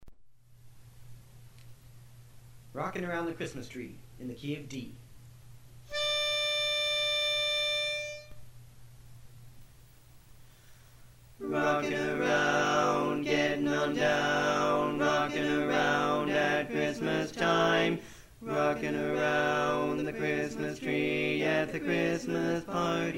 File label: Bass, learning tracks; Christmas